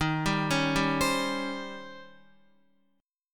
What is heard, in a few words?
Eb 13th, Guitar; Eb13 arpeggiated; JGuitar